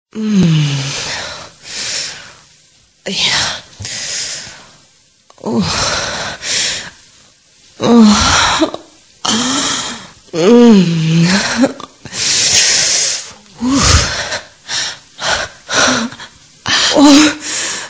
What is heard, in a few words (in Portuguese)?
Gemidos; Vinhetas